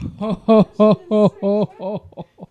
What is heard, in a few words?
laugh